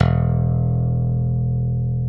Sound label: E0 LONG, STIK BASS; D-STIK BASS2